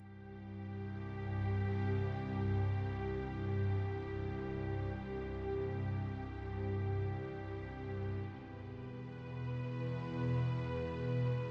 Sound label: Main theme Synth